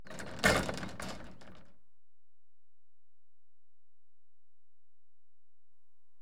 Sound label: Signal Falls; BBC Sound Effects Library; Age Of Steam